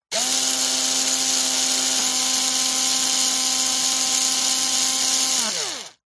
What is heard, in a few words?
industrial electric cordless screw driver or drill long burst; zapsplat